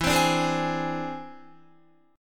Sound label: E Augmented 7th E down Guitar; E+7; strummed